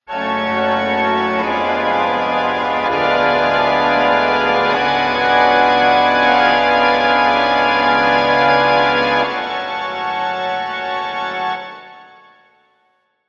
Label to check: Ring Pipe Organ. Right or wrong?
Right